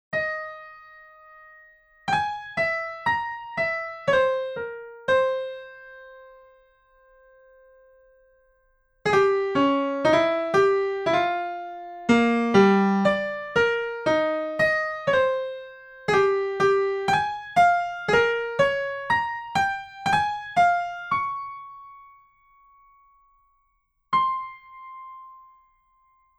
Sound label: Impro Melody